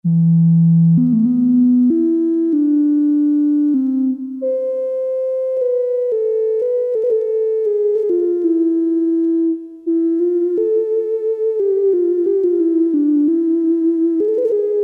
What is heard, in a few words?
Bass Flute, Farfisa Syntorchestra